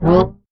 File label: vroam; Truth-Hz - 1-Hit KO Sample Pack